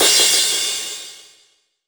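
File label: cymbal, Drum & Bass; Ueberschall; Drum & Bass Resonance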